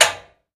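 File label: Washing Mach Open Door, washing machine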